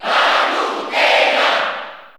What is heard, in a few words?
Cheer Italian; Palutena; SSBU